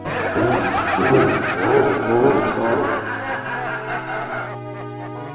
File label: evil bad guy laughs